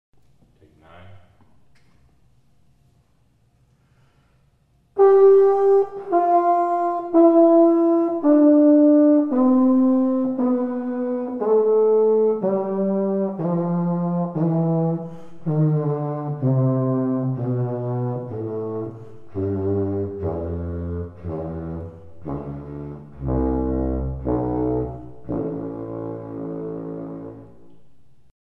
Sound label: Serpent scale